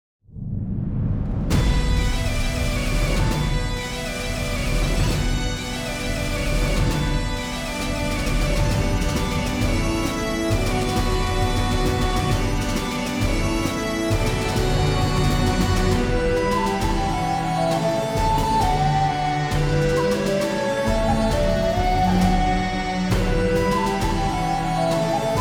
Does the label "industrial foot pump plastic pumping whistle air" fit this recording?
No